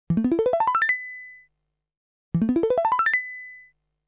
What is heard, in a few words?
Sound Effect Salary, string quartet